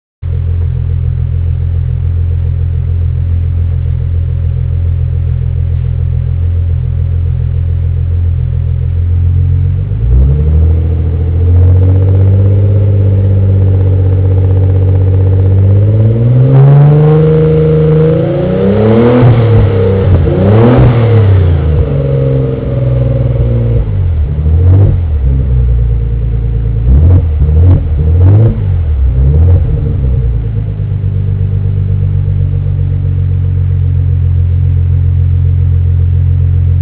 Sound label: exhaust